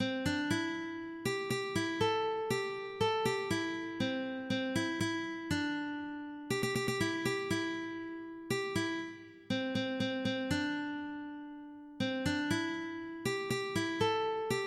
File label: menuxa, ukulele